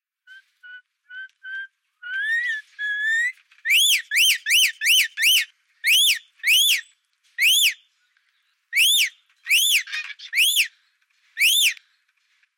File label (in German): Allfarblori